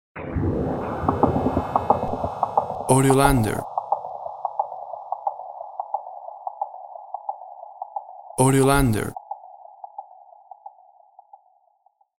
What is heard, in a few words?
Witches Cauldrun Lo